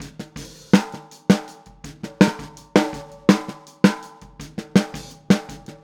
Boogaloo, Snare, 82 BPM; Drums; Verse; Sonic Foundry; Drums from the Big Room The Mixes

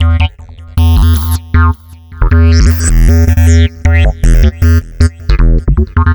Synth Bass

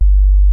SINE-WOOF G1, ANALOG BASS; Music Store - Claudius Diemeter Schwerlos

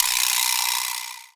VibraSlap; Multisamples; Digital Sound Factory Planet Earth

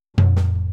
Boogaloo - Tom Floor, 82 BPM; Drums; Intro; Sonic Foundry; Drums from the Big Room The Mixes

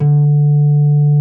D2, SYNTH PLUCK; Music Store - Claudius Diemeter Schwerlos

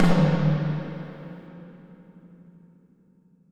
FX TOM, Drums Acoustic; USB Soundscan vol.10; AKAI; 90s sample CD

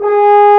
Fr Horn mf; G#3; Multisamples; Digital Sound Factory Virtuoso

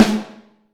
Snare Drum; Q Up Arts; Johnny.C's Vintage Snare Drum; 5.5X14

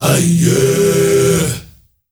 MALE HUMMERS; Africa; Spectrasonics; Heart of Africa 2

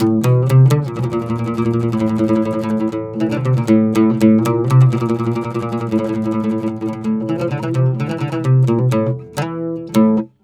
120 oud

